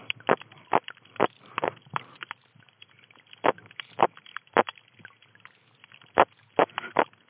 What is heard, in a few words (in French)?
gorette haemulon aurolineatum haemulidae captivite